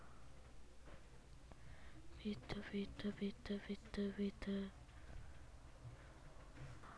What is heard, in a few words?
beat